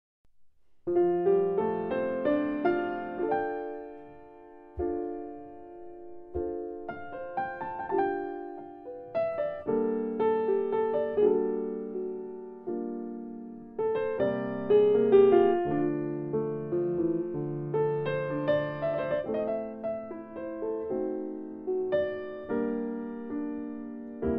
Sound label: wedding piano